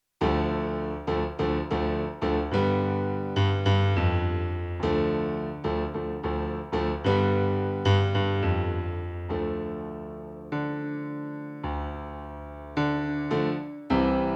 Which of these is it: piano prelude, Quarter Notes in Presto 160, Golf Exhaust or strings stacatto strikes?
piano prelude